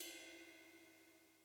Ride

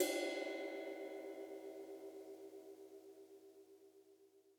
Ride

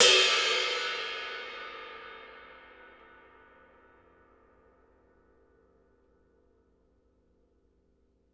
ride Hi

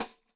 tamb hit

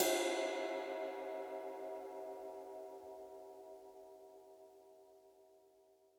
Ride

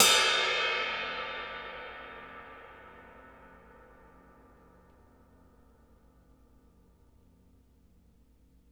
ride Hi

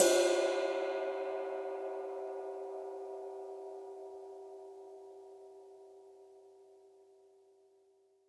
Ride

